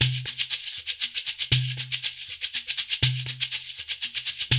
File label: Bembe 6/8, World Dance